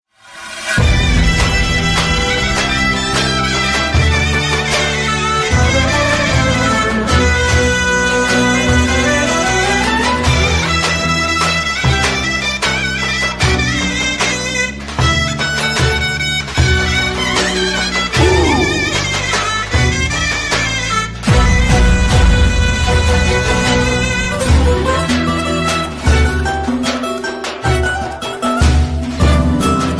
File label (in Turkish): soz dizi zeybek